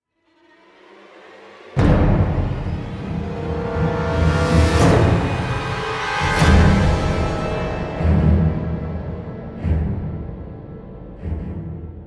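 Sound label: ambient orchestra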